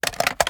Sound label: ar reload mag in; gmod; viper